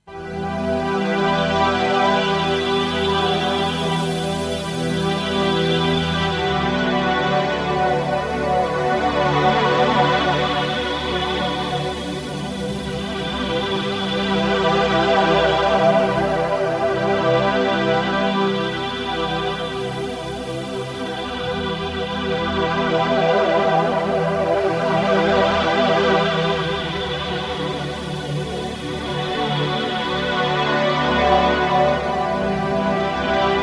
Chorus Pad

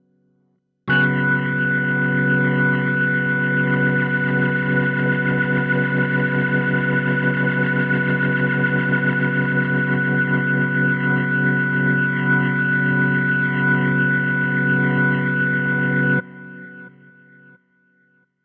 Korg SV 2 Hammond AMP Organ Rotary

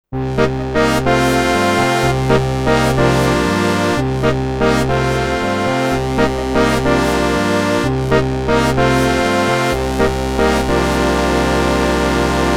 Montage CS80 FM; Part 1 Werkspreset edited

